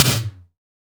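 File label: SDS V SNR, snare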